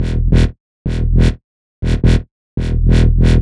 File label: 140 E BASSLINE, Dubstep